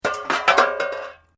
Metal Light Movement Minor

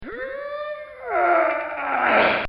Straining, Train; Train Sound Effects; Vehicles

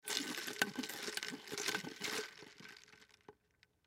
Cup Stir Shake Move Slosh Styrofoam Ice; Drink Mixing; Blastwave; Foley

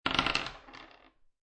Dice Throw Two Dice On Wood Table; Dice Sound Effects; Dice (Sound Effects:Casinos)

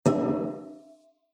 Clang; Clang Sound Effects; Impact

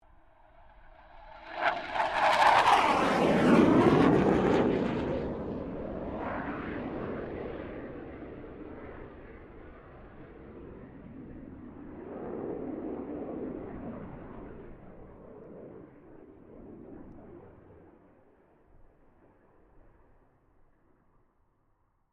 1997 BAE HAWK 127 PASS; Airplanes (Sound Effects:Vehicles)

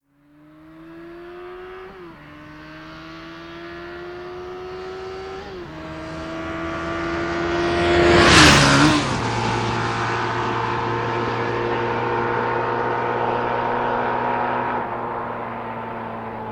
Motorbike Suzuki 750 Up and Past Fast; Motorcycle; Tom Hutchings

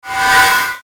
Static Sweep Static Swell, Static High Frequency; Production Element Imaging Element Accent Transition; Blastwave; Static Sound Effects

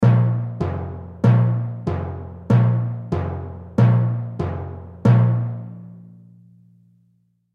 Timpani Slow Fourths Rhythm; Hit; Musical; Blastwave

